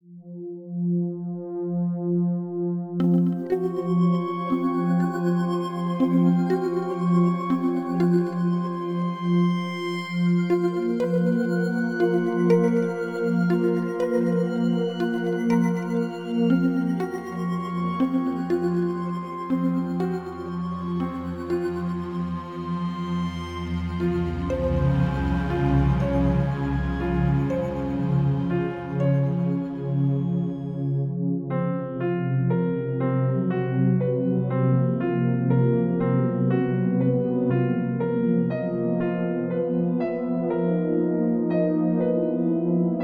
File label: Ominous